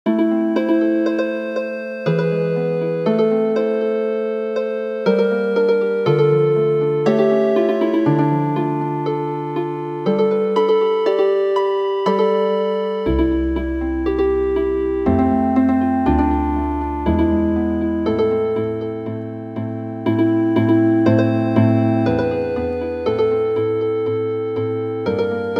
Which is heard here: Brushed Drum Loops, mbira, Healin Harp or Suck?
Healin Harp